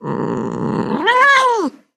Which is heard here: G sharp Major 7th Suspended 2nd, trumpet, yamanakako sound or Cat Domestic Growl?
Cat Domestic Growl